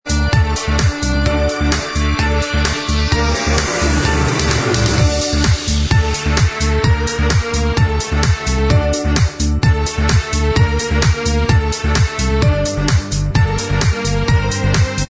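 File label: Instrumental Track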